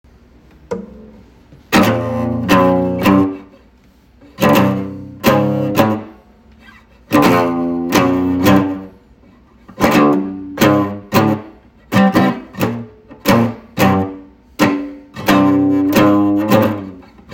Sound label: jam